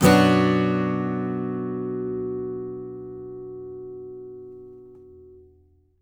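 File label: Acoustic guitar B minor down, Country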